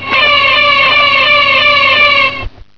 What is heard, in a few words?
ALARM; 999wavs